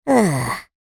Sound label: cartoon character male voice relax sigh; zapsplat